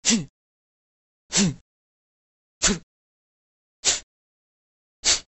duhanie pri udarah

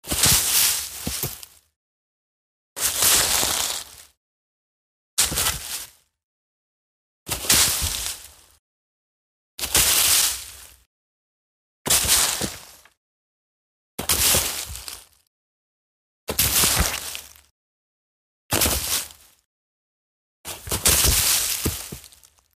padenie v kuchu listev

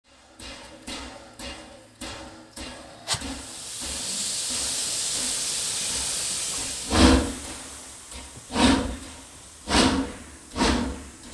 otpravlenie parovoza

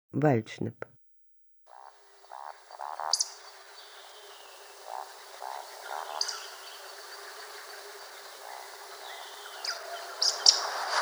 hriplyj zvuk valdshnepa

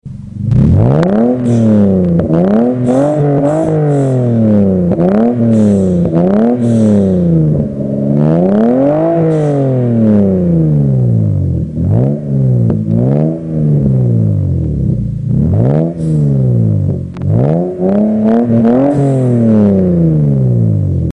zvuk motora mashiny

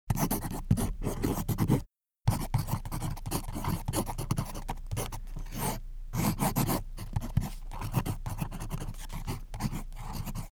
pisanija karandashom

